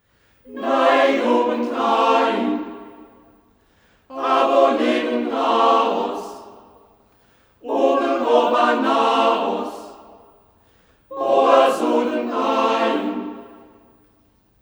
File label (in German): froeschechor